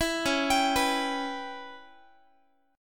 Db Minor 7th Flat 5th Db down Guitar; arpeggiated; 4A,4E,5A,5D,5G#,6C#

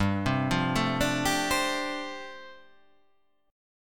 G 7th Suspended 2nd Suspended 4th G; x,10,10,10,9,9,9,9; down

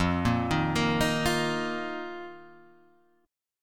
F 7th Suspended 4th F down Guitar Half Step Down

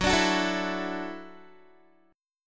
F sharp Minor 7th Sharp 5th, Guitar; F#m7#5 strummed; 14,17,16,14,16,x

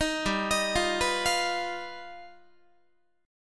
G Augmented 7th, Guitar; arpeggiated

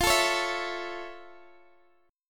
F Augmented Major 7th, Guitar, Drop D; x,x,15,14,14,12

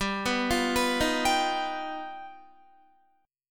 G Augmented 7th, Guitar; G+7 arpeggiated; 14,14,17,16,15,15; JGuitar

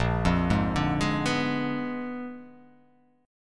Bb 7th Flat 9th Bb, Bb7b9 chord; arpeggiated; JGuitar

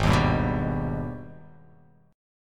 Ab Minor Major 7th, chord; strummed; JGuitar

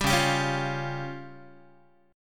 Db Major Flat 5th, Guitar; strummed; JGuitar

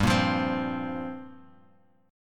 G Minor 11th, Bass Standard; Gm11 strummed; JGuitar

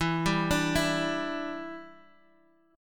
E Minor 7th, down; Em7; arpeggiated

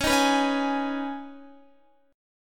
Db 7th Suspended 2nd Db, down, Guitar; Db7sus2 strummed; tuning=4E,4A,5D,5G,5C,6F

